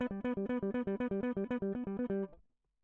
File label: electric